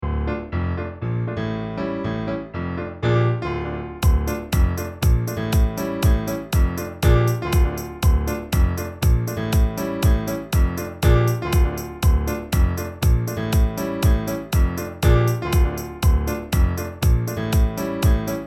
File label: Christmas Electro Swing